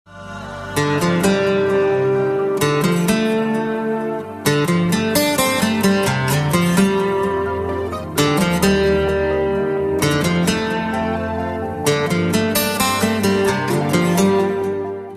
guitar soul ringtone

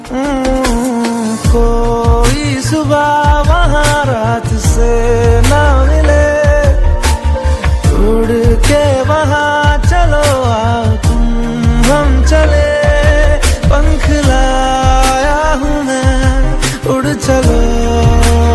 romantic ringtone, bollywood ringtones

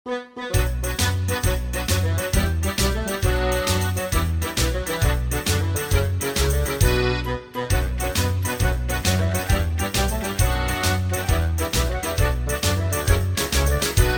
italian folk ringtone

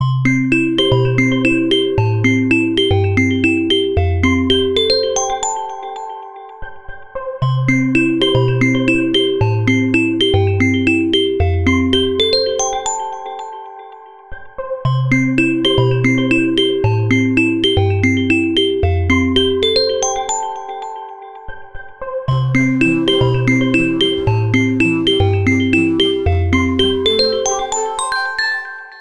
nokia 61 plus ringtone

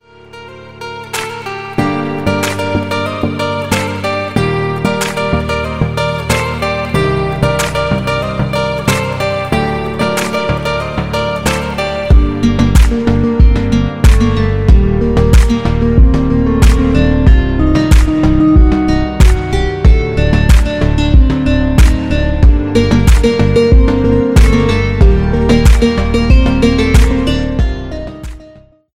glitter ringtone

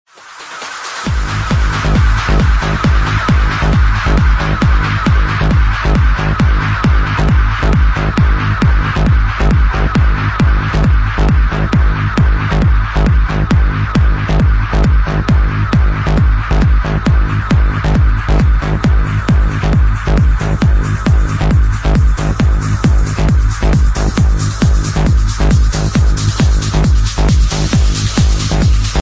analog feel ringtone

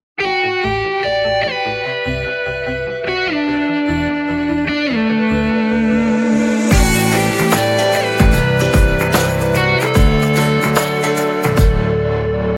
tone ringtone; new iphone

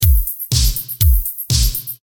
repeating drum sound message tone

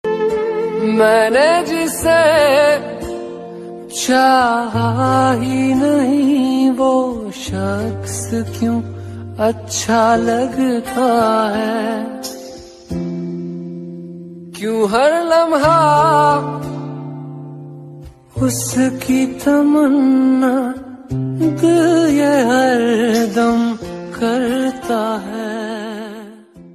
sad song status ringtone, bollywood ringtones

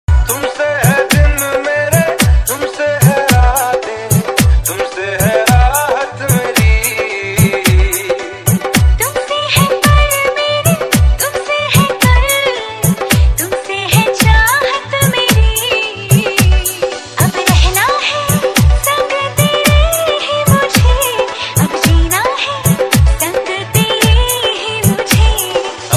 instrumental ringtone

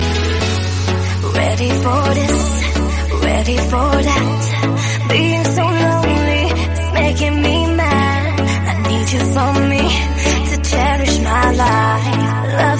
hip hop musical tone ringtone

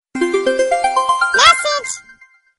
cute short message tone ringtone

